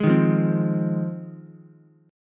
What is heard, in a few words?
G sharp 6th, Ukulele; strummed; JGuitar